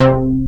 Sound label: dark bass C4; Best Service Dream Experience